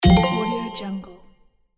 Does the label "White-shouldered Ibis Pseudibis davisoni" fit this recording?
No